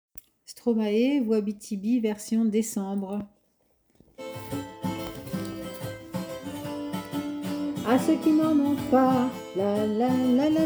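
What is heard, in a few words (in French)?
VOIX basse tenor baryton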